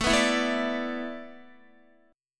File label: A Suspended 2nd Suspended 4th, Guitar; Asus2sus4; strummed; x,12,11,9,9,10